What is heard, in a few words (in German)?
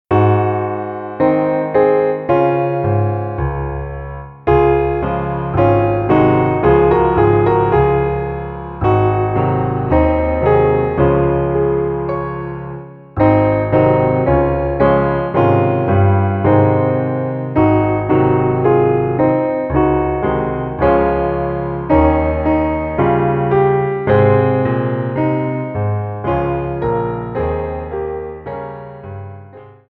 kling gloeckchen klingelingeling inst; Weihnachtslieder